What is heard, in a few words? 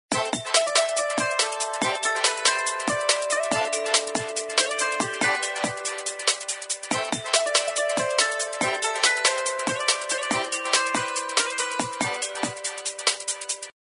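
Htc Cobblestone, ringtone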